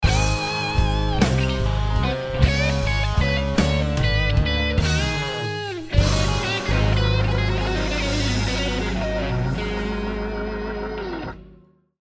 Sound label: blues sting